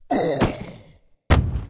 Mob die; Monster; 훈련된설인

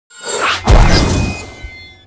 skill shot fire

